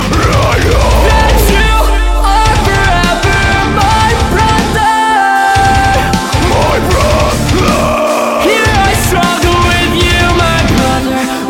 heavy metal

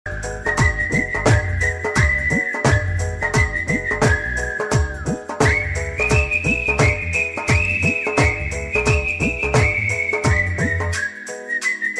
whistle

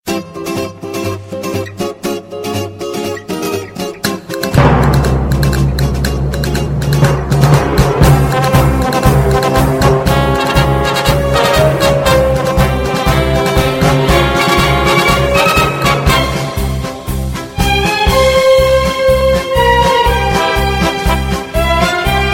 spanish tone

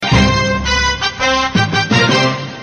tv patrol news

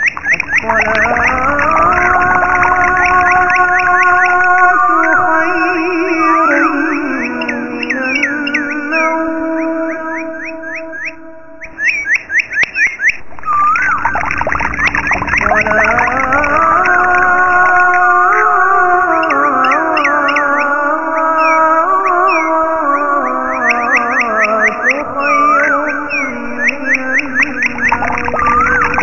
morning azan

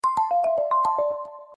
notification alert; windows phone

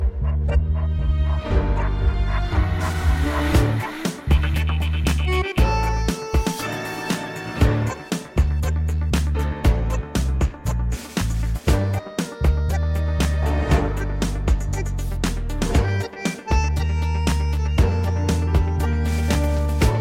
tango

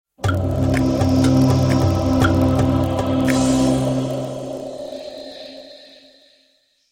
ubuntu start

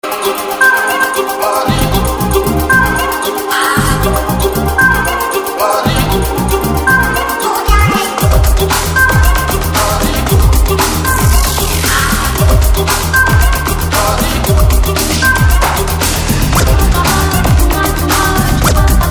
remix; gupt

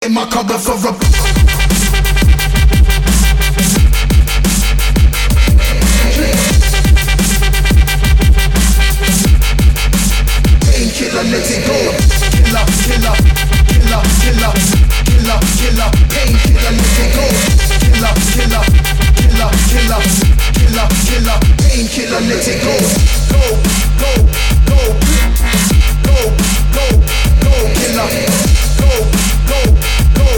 dubstep remix

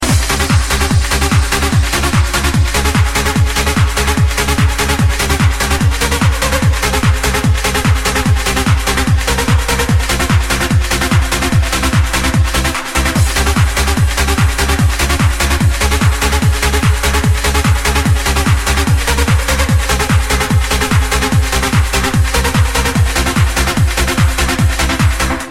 techno nexus

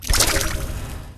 email sent; fallout; pip-boy

